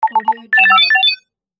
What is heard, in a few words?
access granted